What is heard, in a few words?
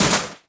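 Metal Collapse Small; objects; Star Wars Obi-Wan; XBOX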